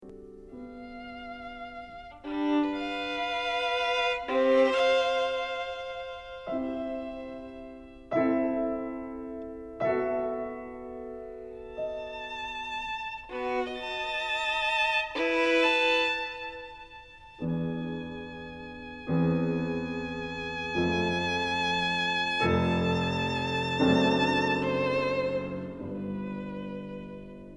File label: VIOLIN PIANO